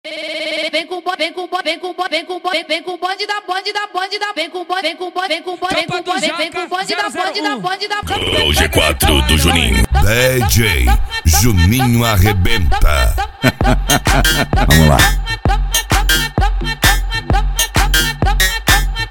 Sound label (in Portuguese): cd funk automotivo